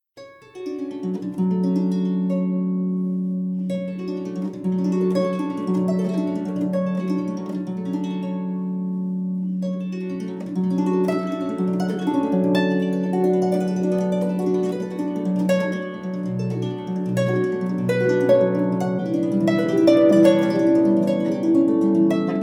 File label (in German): Harfenklang Wolken